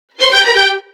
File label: STRING LICKS, Disco